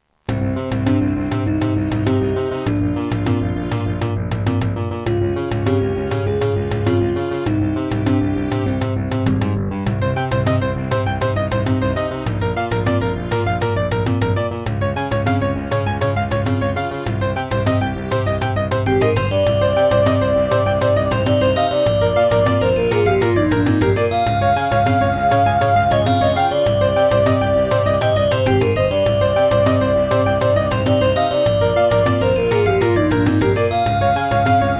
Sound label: Introduction, Serdaco Qwave; Hocus Pocus